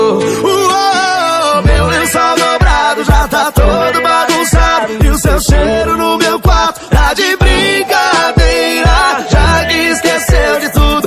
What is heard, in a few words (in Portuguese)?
musica; cstrike